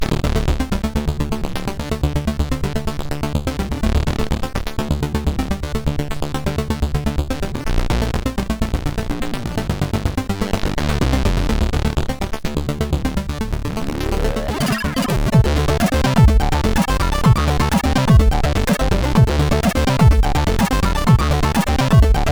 Dreckpulse Short sid CSG8580R5

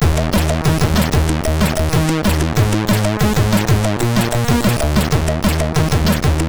Bass, sid MOS6581R3

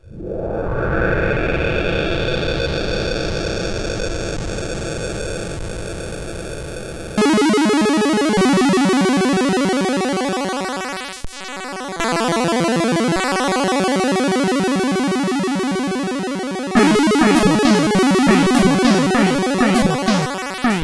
Airplane, CSG8580R5; sid; hvsc

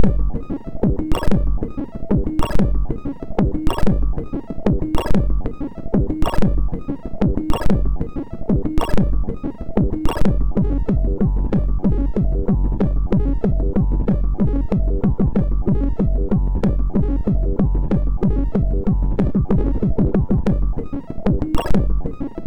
Seconds of Hard Techno, sid MOS6581R2

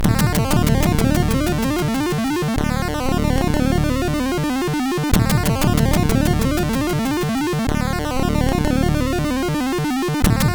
Trance, MOS6581R4; Digger; hvsc

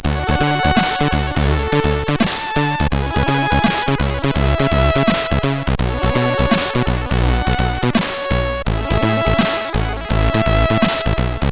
Slammer, CSG8580R5

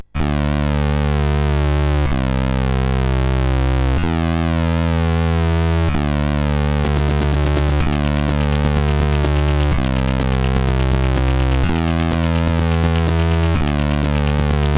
Mightywriter, CSG8580R5

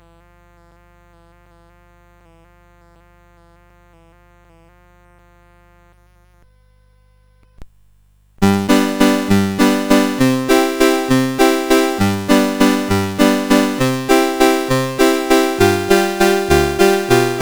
Chopsticks

